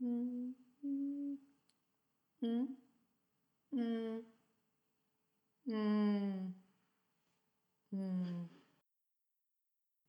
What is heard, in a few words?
mmmmm